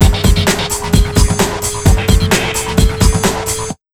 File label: HARD 130 BEAT, Big Beat Drumloops; USB Soundscan vol.25; AKAI